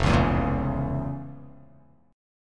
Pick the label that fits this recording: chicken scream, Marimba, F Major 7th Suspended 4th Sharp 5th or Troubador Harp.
F Major 7th Suspended 4th Sharp 5th